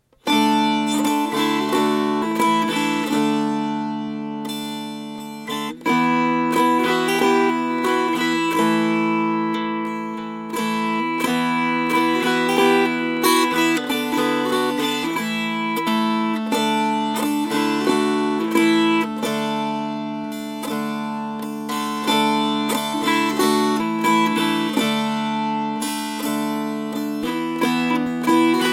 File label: Strummed